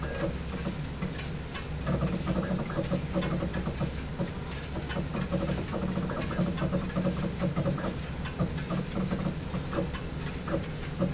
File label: Lab Ambient; Sotha; The Elder Scrolls III - Morrowind; Morrowind GOTY GOG